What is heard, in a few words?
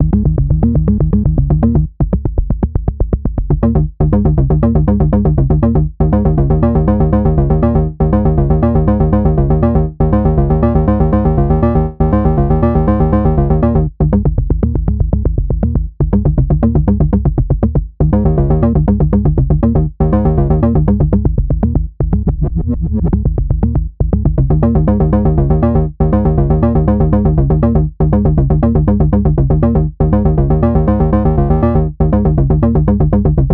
Oberheim SEM VCF ENV Attack Decay Sustain; G-Force Software